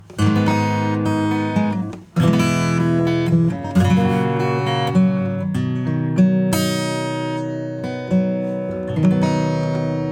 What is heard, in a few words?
Limit acoustic; SSL X